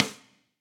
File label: Snare Sidestick; StudioBasic; Drum Hits; Session Drums Studio; Standard Hits